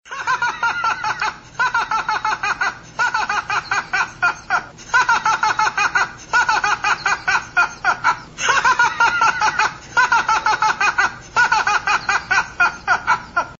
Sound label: annoying laugh; family guy stewies